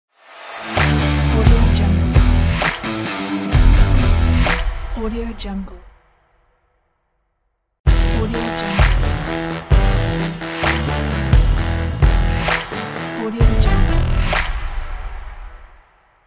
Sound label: fashion logos and stylish promo idents pack